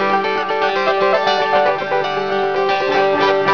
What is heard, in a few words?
guitar